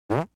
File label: french fart